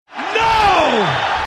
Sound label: purple panic button